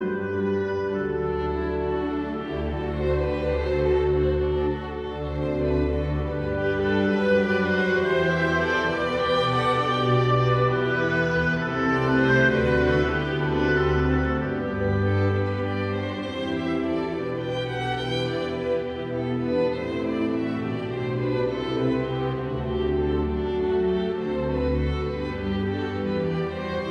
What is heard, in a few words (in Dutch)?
instrumentaal klassiek